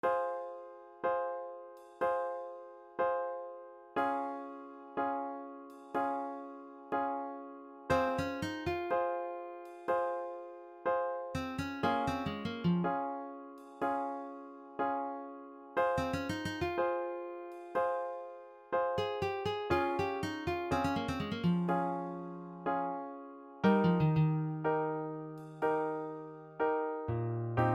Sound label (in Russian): muzyka dlya kino